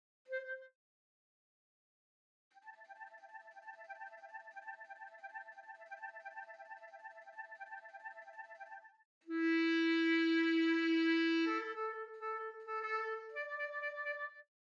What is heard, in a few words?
Clarinet; Holst Jupiter